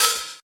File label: open high hat e key